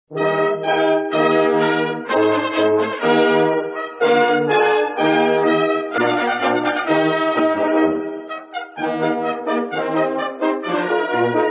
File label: brass; MILITARY MUSIC IN ITALY; WWI